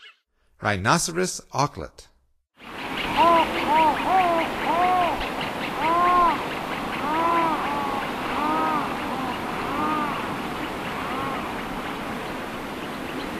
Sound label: Rhinoceros Auklet; North America; Stokes